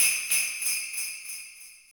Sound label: tambalin